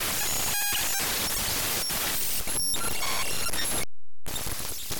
Digital Raw Data, Scanning Data, Harsh, Movement, Glitch, Noise; 344 Audio; Data Processing